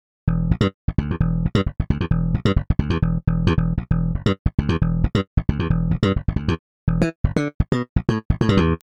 pull Bass

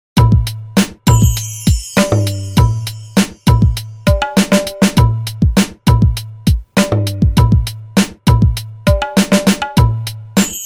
hip hop style

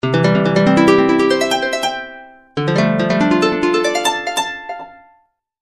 harp

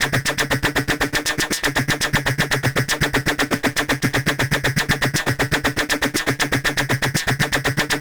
drumpack loop ring mod snips

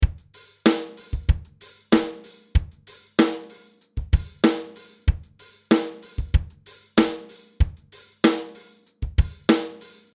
Pop Reggae 95

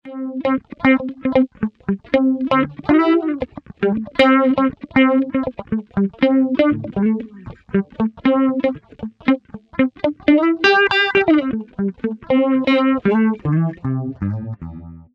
Small Stone

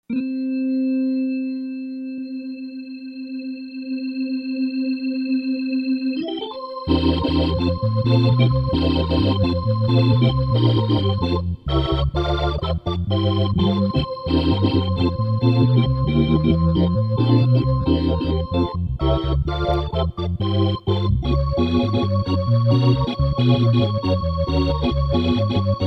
Gospel

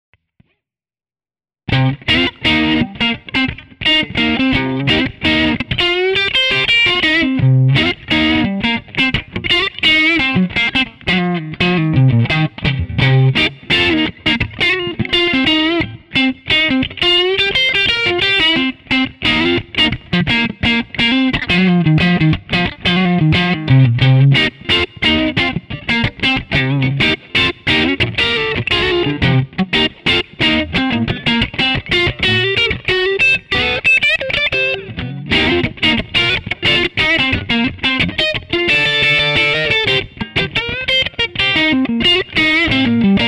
FAT crunch

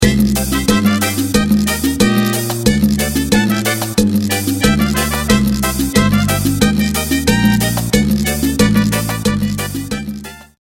MC-909 cumbia

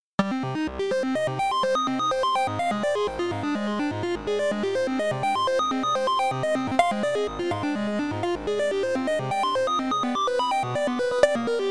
Phased ringer

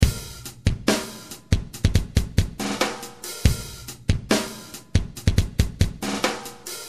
Half Timer

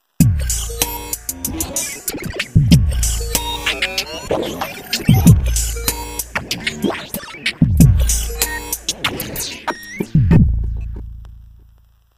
V-Synth dusted loop

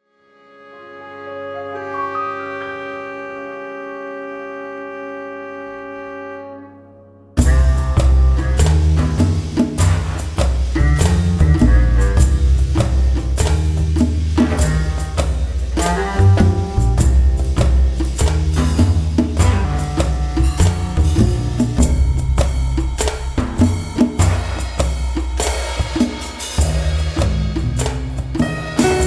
Smokey

